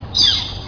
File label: flicker